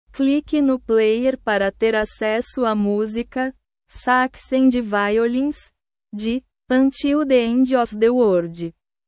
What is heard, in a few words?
sax and violins